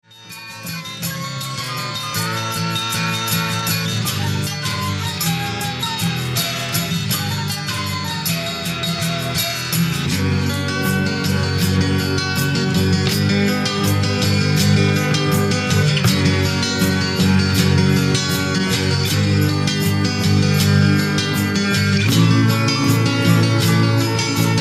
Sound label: Bass Players